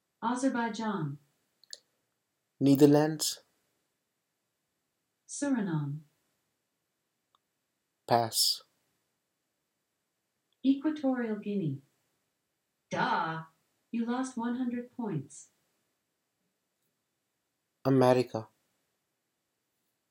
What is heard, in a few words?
country game pass